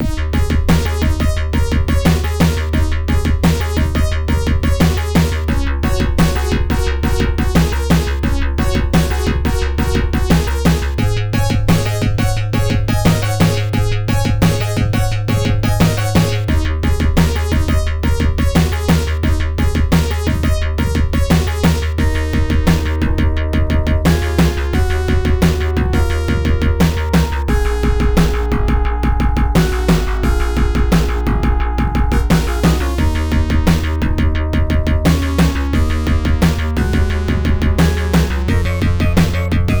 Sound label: Techno Tune; Mick & Mack as the Global Gladiators; Genesis